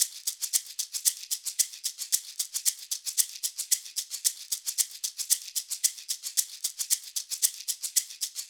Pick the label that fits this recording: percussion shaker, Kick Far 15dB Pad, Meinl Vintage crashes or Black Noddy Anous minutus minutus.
percussion shaker